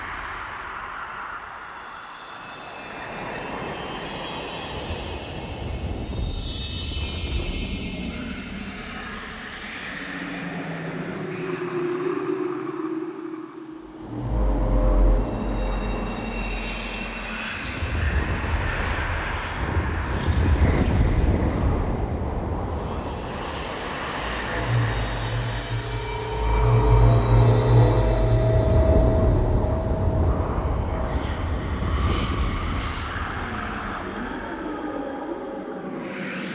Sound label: Exterior Hub Loop, Ambience Infested; Warframe; Infested Eris